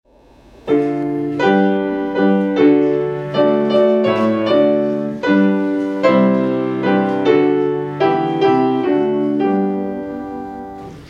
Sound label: hymn; Lord Jesus Christ, Be Present Now